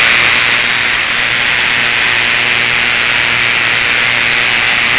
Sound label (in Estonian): Elektriline Koroona